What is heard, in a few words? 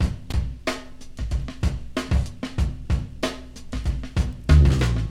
94 bpm drum loop sample C# key, Free drum loop sample - kick tuned to the C# note